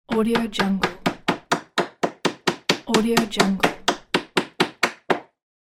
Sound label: hammering nail